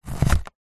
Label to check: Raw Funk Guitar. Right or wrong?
Wrong